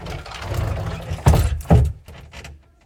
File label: door close; Golden Crayon Sounds